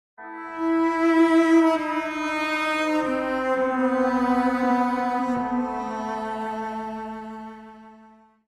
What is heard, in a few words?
cello